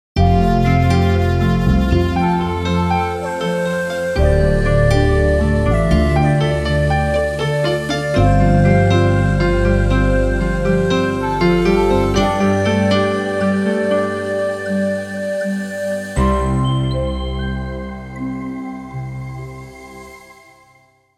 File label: Genre Chill